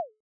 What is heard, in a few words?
modal close